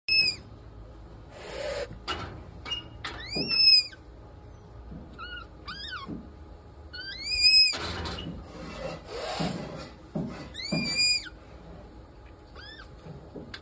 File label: Red panda; پاندای سرخ